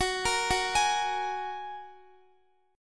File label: F sharp Major Add 9th, Guitar; F#add9 arpeggiated; x,x,18,15,18,16